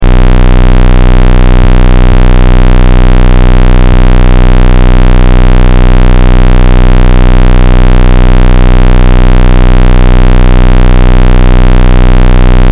Test Tone Square; Wave 31.05Hz 60 Secs 0Db Loop; Blastwave

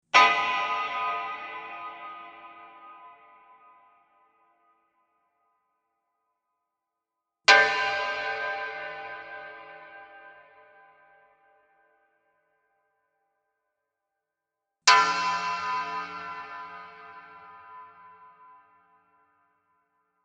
Metallic Clangs, 4 x Metallic clangs.; Objects

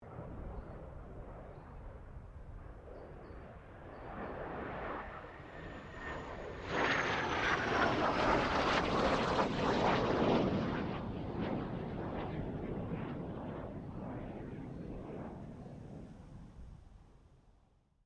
AIRCRAFT JET PROVOST T2 PASS; BAC Jet Provost; Airplanes; Vehicles

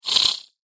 Fragile Air Laser Beam

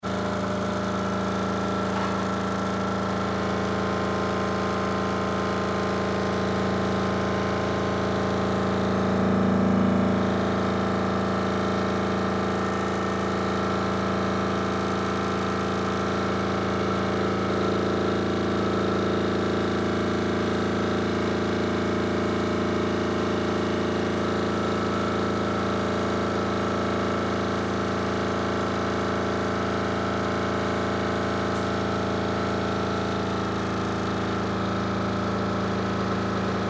Ambulance Compress; Emergency

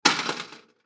Coin Quarters Drop; Money; Foley; Blastwave

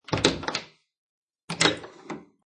Wooden Door Unlock; Locks

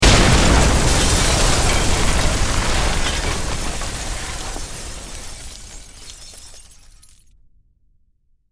Explosion Building Crash; Explosions

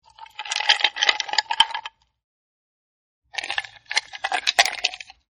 Ice movement, Ice Moving Around Inside A Cup; Ice Moving Around Inside A Cup-2 Takes; Drink Mixing Sound Effects

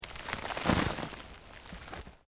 EARTH LOOSE MOVE LONG, Debris; Material components for constructing more complex sounds; Materials

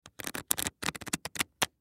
Cards Shuffle; SPORTS; Blastwave

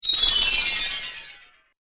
Magic Chain Impact

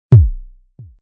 Bass Drum Single; Bass Button Sound Effects

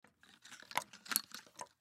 Darts Pull Out Holster; Arcade; Games

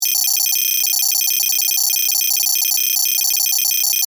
Data Hard Drive Beeps, Readout, Scifi; Tech

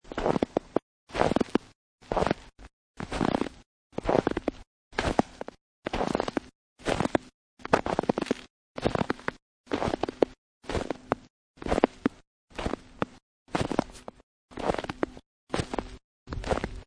Snow And Ice Footsteps Snow; Weather

